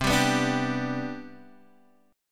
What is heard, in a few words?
C Major 7th Suspended 2nd, C, down, chord; CM7sus2 strummed; JGuitar